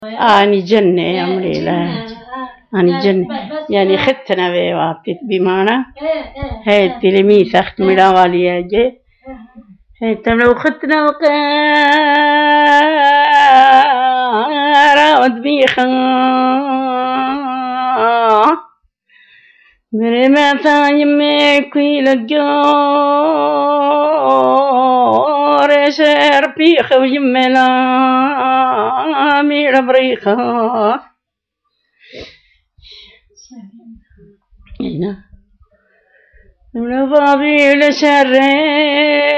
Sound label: song, jinne, funeral song